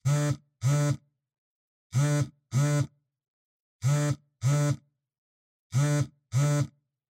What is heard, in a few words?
Cell Phone Vibration Cloth